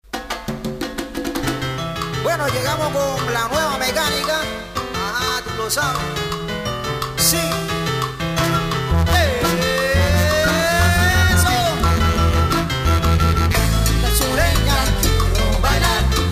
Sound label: rumba